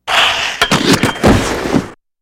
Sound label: Hockey Player Skate